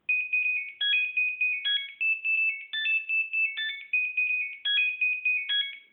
nokia 1100 samba ringtone

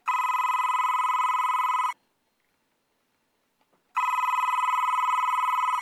wall touchtone ring

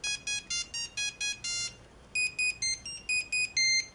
nokia 3210 ringtone; playground

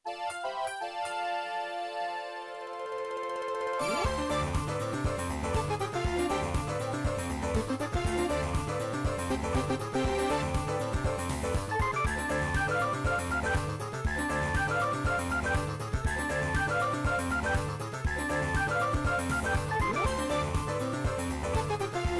nokia 1110 ringtones mars

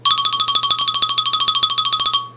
trill ringtone; apple iphone 3g 4

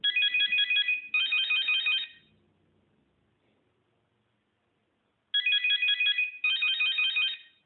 nokia 1100 intro ringtone

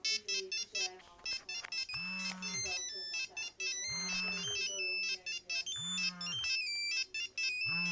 nokia 5510 ringtones hurdy gurdy

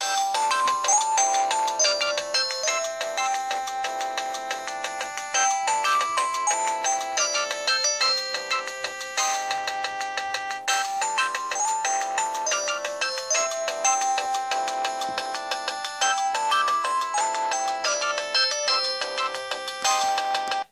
bossa nova ringtone, samsung sgh t100

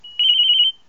nokia3510i bee ringtone

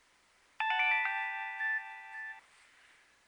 nokia3100 ringtone

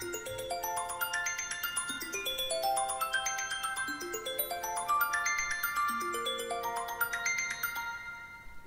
apple iphone 5 waves ringtone

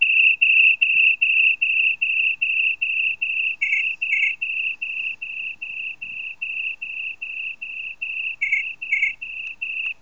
nokia3510i cicada ringtone

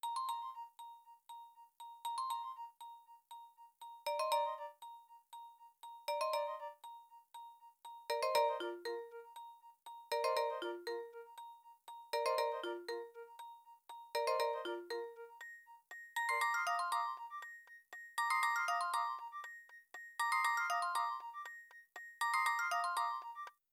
nokia 2600 clocksmith ringtone

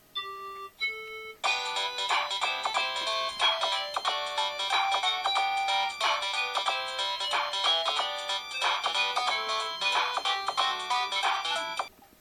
samsung sghe700 ringtone

